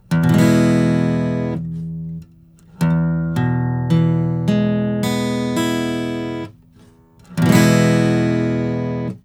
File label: Major or Minor